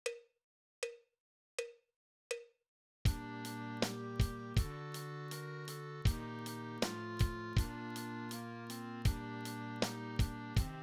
perc

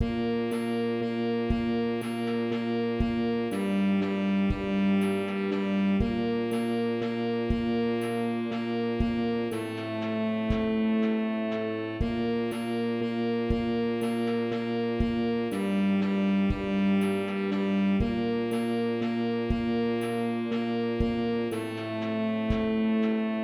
sax bar; jul

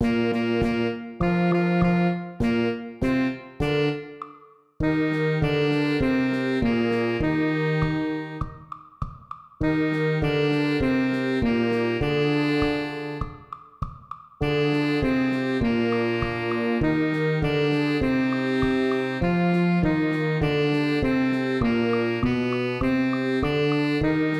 bar altsax

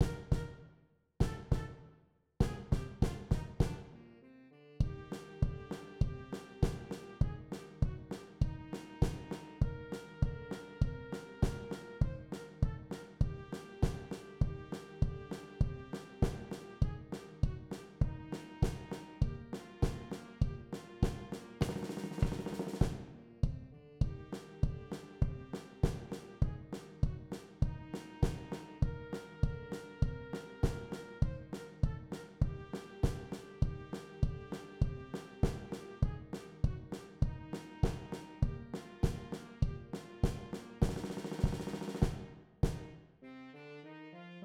perc

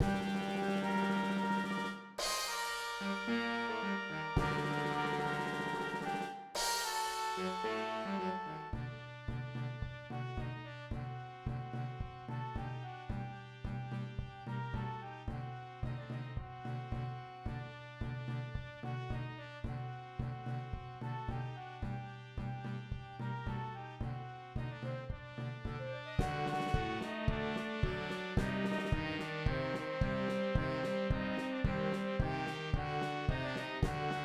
perc; HavahNagilah

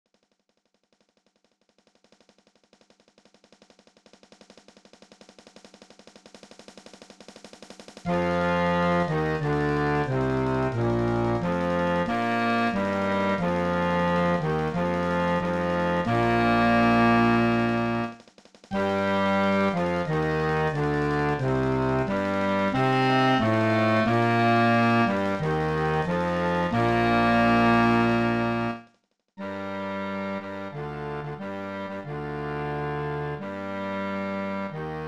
tuba; JaViElsker

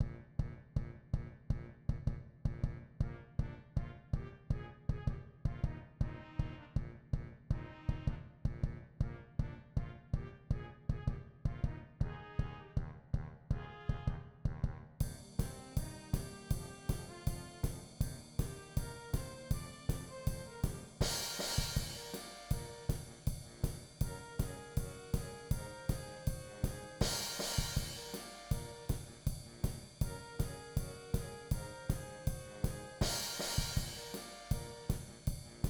perc

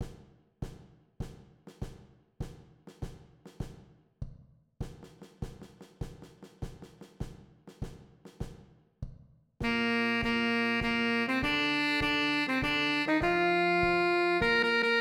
altsax

